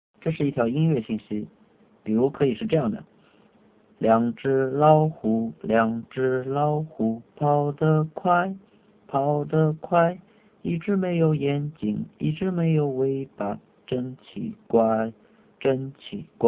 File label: music